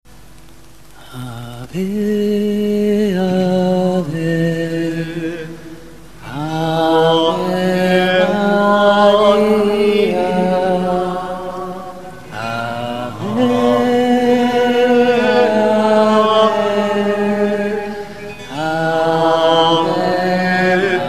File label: ave